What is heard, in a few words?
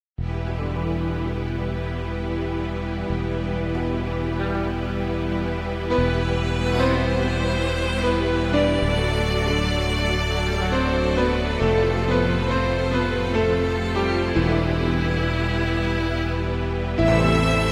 brass minus